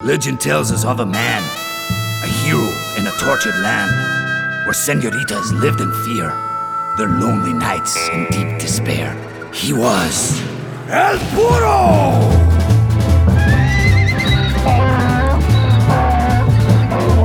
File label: Mule Kick Perk-a-Cola Jingle; Moon; Call of Duty Zombies; Treyarch 2008